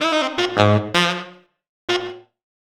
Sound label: Sax